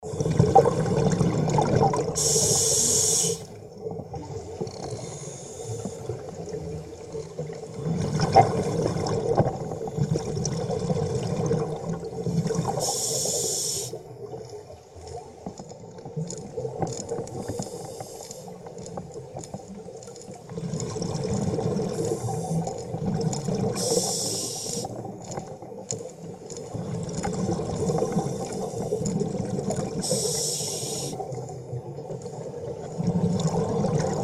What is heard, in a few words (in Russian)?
Pogruzhenie akvalang